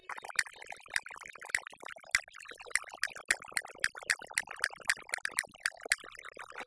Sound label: Castanets Violin Applause